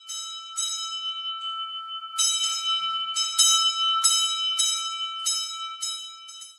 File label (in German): Handschelle